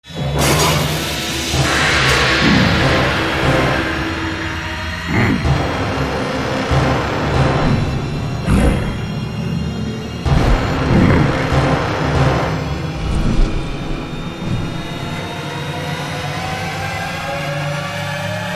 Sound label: Death; Rezlus Death3